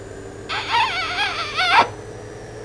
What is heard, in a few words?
kiss; Sound Library 2000; Wayzata Technology; People